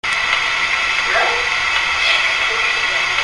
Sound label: sneezes; A Little Girl Sneezes